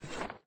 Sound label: pocket shovel